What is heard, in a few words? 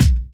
BD, Dance Mega Drums, HIP